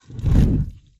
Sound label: Fire Lighting Burst; SoundsCrate